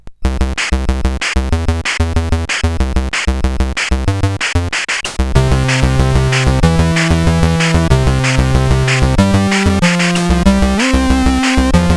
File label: T-Rex 64, CSG8580R5